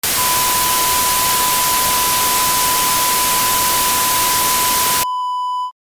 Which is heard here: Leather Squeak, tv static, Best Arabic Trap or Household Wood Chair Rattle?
tv static